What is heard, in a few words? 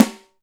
Snare, Alesis DM5